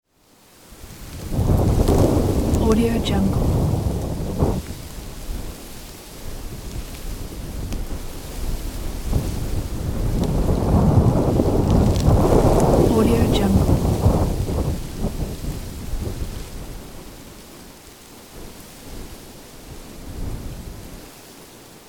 gusty wind in the field

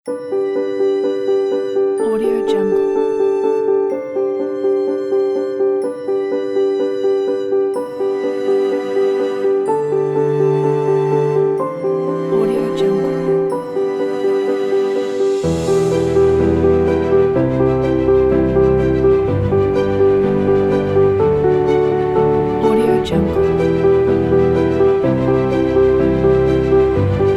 nature inspirational awakening

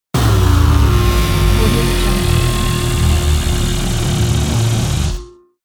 turn off

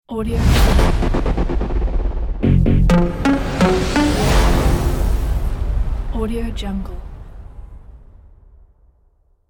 outro logo

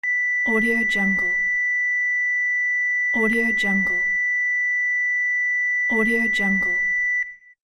ekg hospital machine flat line

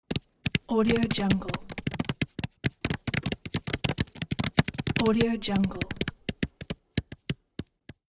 popcorn popping

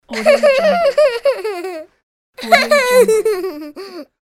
little boy laughing

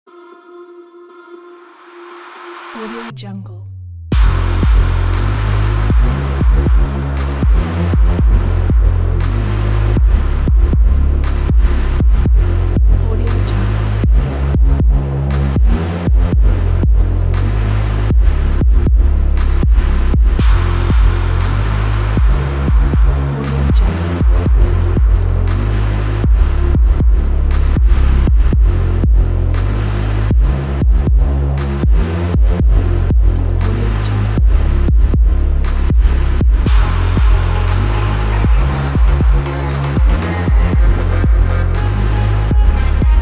the abstract powerful rhythm; eliansproductions

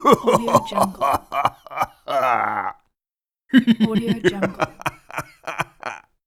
man laughing

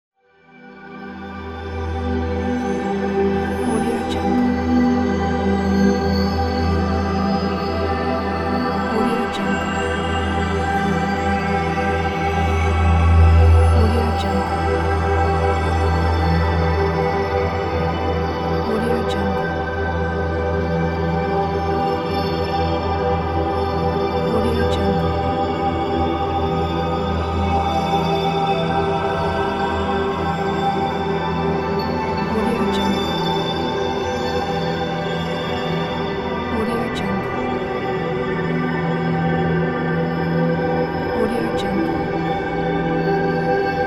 magic soundscape

